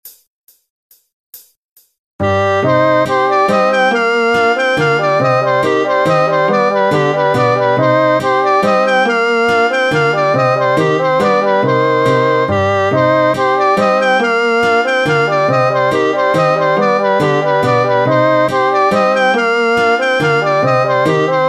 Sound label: Mazurka; Fubu